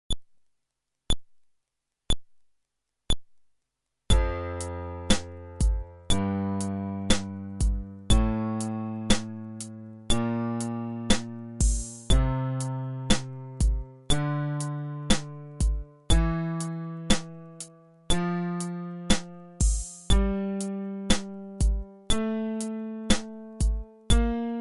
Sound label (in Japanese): フレットレス